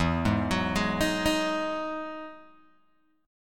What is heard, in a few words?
E Major 9th E down Guitar Open G